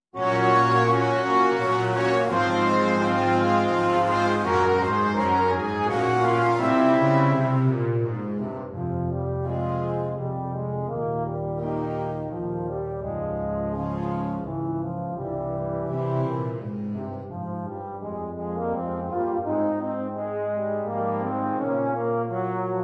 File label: Fanfare